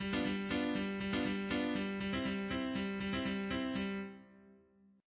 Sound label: rock; orpheus